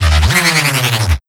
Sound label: Synth Roll